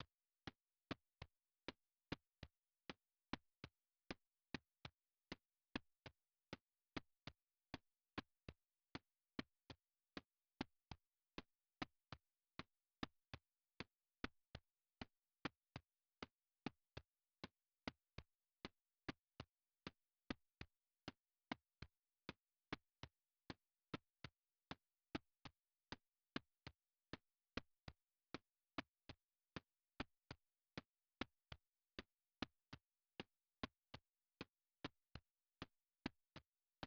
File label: 99 BPM A# Min Percs; Loop Stems; Cymatics; Infinity Melody Collection; Feeling Good